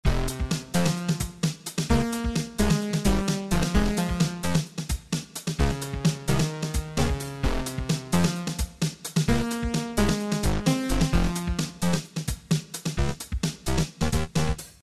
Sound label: Pause Menu Theme; Bionic Chainsaw Pogo Gorilla